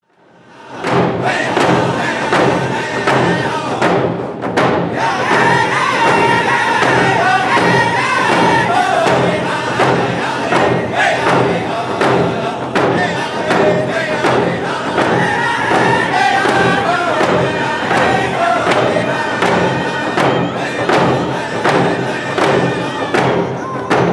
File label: Round Dance Song